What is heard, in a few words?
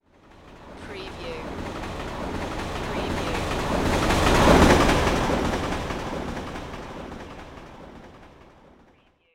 Subway Pass By